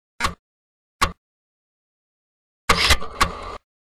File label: splash